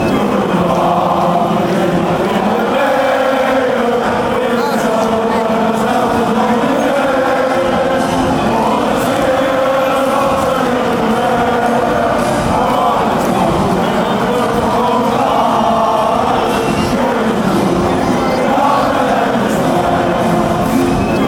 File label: belgium national anthem